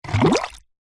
nature water short bubbles bubbling air blow into water in plastic pot